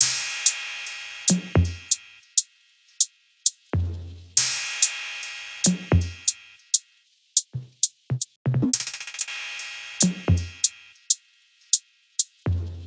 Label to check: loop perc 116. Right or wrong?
Right